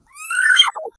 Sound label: sound which play when cha, chameleon